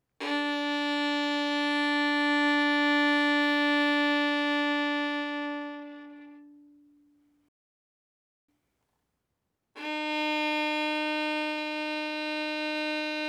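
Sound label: Violin arco fortissimo on D string D4 B4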